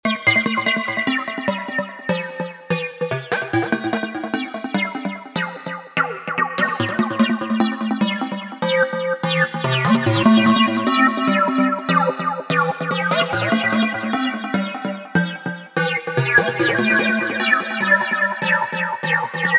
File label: pluck, goa trance bass